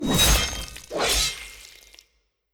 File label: Attack Knighgt Sword